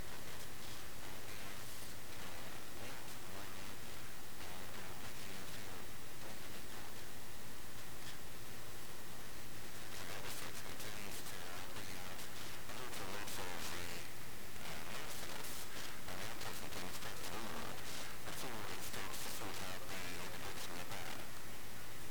silent in ear recording with bone conduction mic before amplification